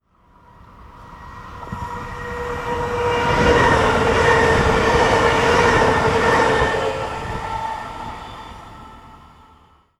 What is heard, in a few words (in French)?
trains diesel et electriques; sonotheque